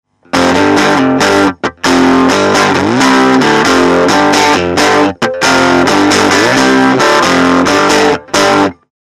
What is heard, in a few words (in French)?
tele clair chevalet vol 12; fender champion600